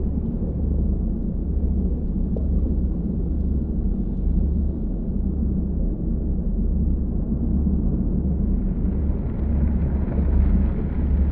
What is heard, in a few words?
ship research station idle; aquatics